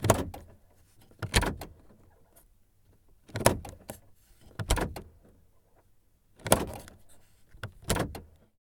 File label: Car Lock Interior